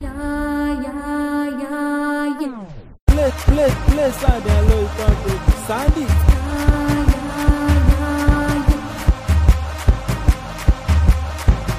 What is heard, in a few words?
Electrify Street House Beat